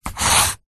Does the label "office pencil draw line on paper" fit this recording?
Yes